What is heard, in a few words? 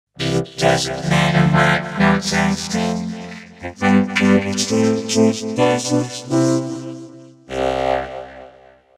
Synthesiser